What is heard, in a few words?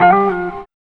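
GUITAR RIFF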